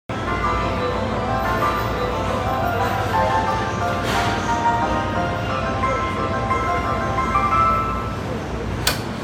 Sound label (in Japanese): 京浜東北線東京北, train sounds